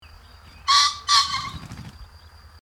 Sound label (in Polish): bazant tokujacy samiec